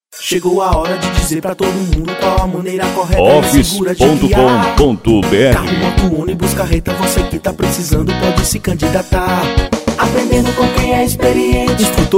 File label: reggae; pique; auto escola moreira